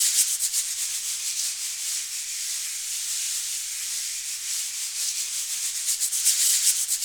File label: shaker, Africa; SHAKER FX; Spectrasonics; Heart of Africa 1